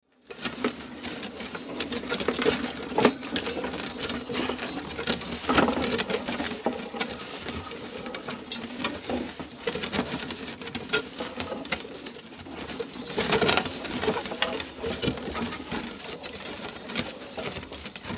Wood Cart Roll Long; Foley; Materials; Blastwave

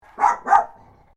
Animal Dog Chihuahua Bark Double; Newcastle; Various domestic dogs; Dog Sound Effects; Dogs (Sound Effects:Animals); Foundation1

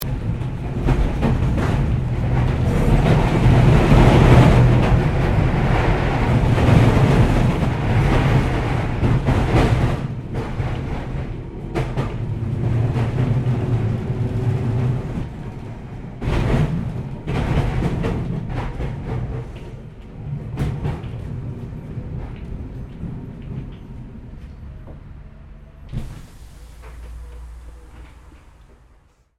Tram Melbourne W Class Crossing Points Stopping; Streetcar; Streetcars

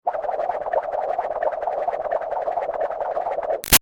Whoosh Sweet Spin Fast Light; Sound Design; Whooshes; Big Room Sound

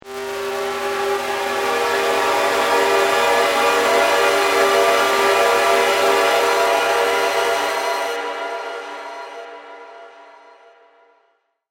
Chiller Transition, horror; Transition Sound Effects

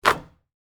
Basketball Net, Sports, Swish; Basketball (Sound Effects:Sports); SoundEffects-BlastWave

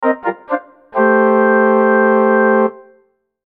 Musical Flutes, Musical Flutes Chords Short; Woodwinds; Production Element Imaging Element Accent Transition; Blastwave

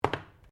JAR PLASTIC PLACE BENCH; Various kitchen jars; Set Down Sound Effects; Set Downs (Sound Effects:Objects)

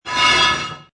Metal Plate drag; Metallic; Metal sound 41 Plate Drag 2

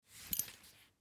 Belt Leather Set, Belt Leather Set Down; Carpet; Set Downs; Objects; Blastwave; Foley

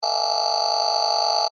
Scanner Beam Ray, Scifi; Tech; Sound Effects:Science

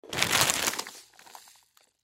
Pretzel Bag Up, Pretzel Bag Pick Up Fast; Food; Foley; Household; Blastwave

